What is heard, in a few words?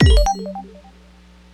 Retry Jingle; Friday Night Funkin' Tails Gets Trolled